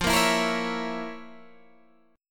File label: F Major 7th Suspended 4th Sharp 5th F, Guitar Open G